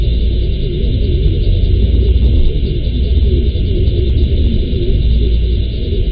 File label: wall; weapons; dota; dark seer